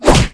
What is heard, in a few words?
Minigoblin Attack; Eft